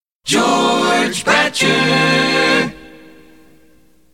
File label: jingle